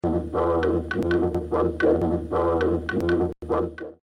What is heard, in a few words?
didg